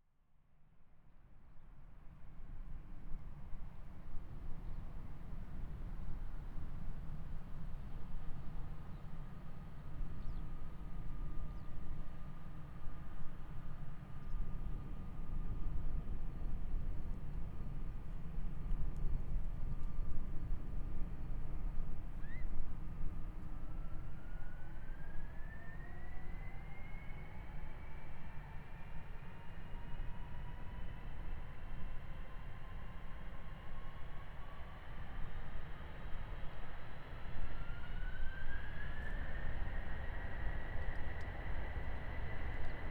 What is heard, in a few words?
Prague airport; praha vaclav havel